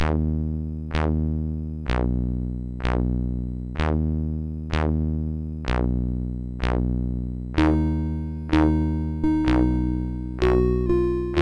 Funeral, MOS6581R4; TNR Funeral

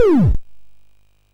sid MOS6581R4; Empire

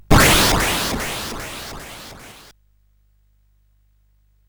War Cars Construction Kit, CSG8580R5

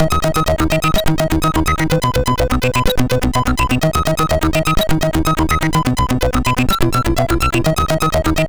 tune, MOS6581R2

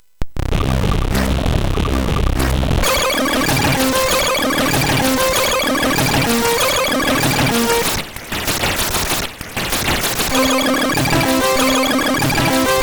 Train in Love, MOS6581R4; hvsc

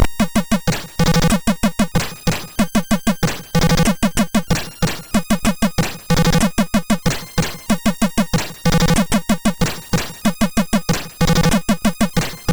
Outrun sid MOS6581R3

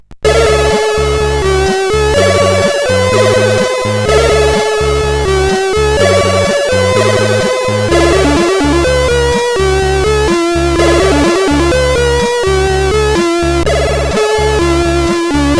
music, sid CSG8580R5

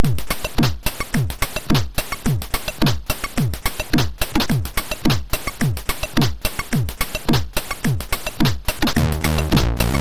Pulse Machine, MOS6581R2

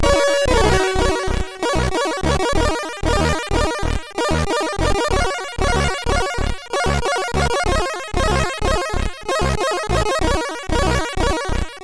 Melancholy, MOS6581R3

